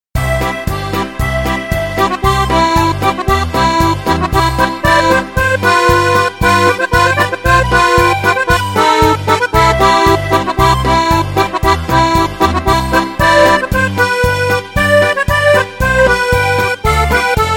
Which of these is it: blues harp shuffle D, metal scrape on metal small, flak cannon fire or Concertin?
Concertin